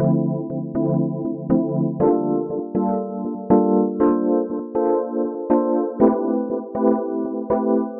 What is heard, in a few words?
smooth deep house rhodes loop 120bpm dm